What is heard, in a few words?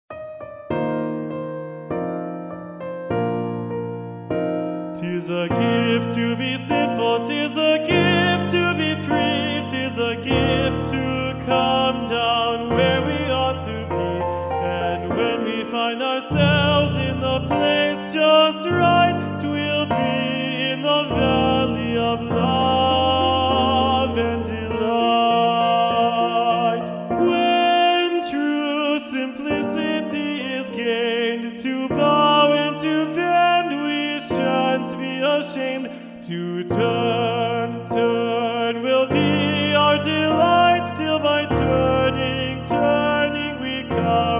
low tenors, simple gifts, Bb major